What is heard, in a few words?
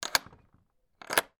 household bolt unlock lock wooden gate; sound effects one